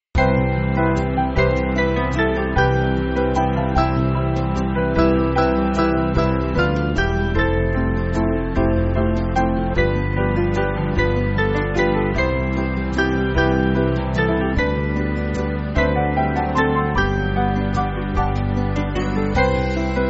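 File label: Band, Backing Files for Churches; Wide Wide As The Ocean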